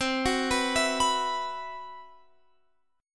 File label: C Major 7th Flat 5th, Guitar Open F, chord; CM7b5 arpeggiated